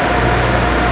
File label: ENG ROOM